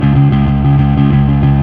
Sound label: Fuzz C sharp 2; torchlight iii; ps4